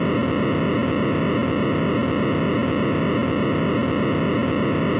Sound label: CDMA Mono; Baseband1